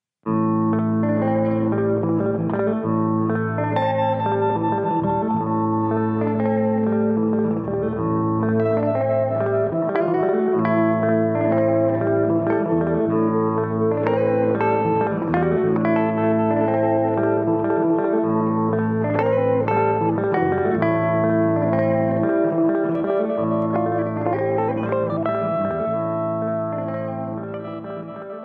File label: riff